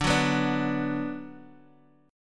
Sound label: D 6th D down Guitar Drop D, chord